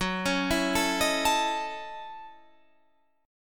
F sharp Minor 13th, Guitar, Standard; arpeggiated; 14,16,14,14,16,17

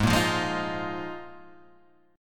A sharp 7th Suspended 4th G sharp, Guitar

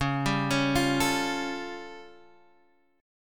A Augmented 7th C sharp

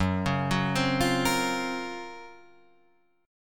Gb Major Sharp 11th, Guitar Drop D

